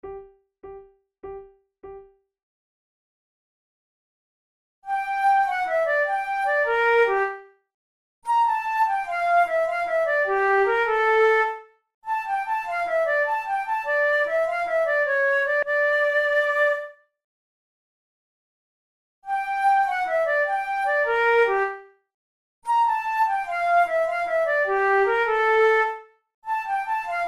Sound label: gigue, flute